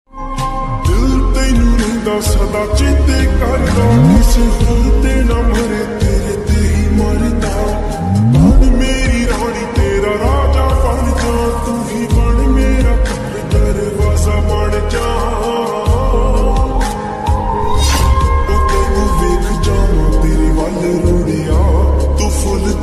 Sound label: ringtone lofi mix slowed reverb